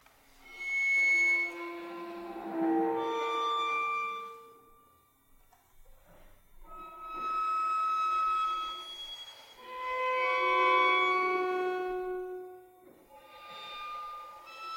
Excerpt from an older piece (to show type of material in string quartet)